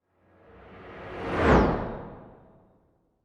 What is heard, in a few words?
Ufo Pass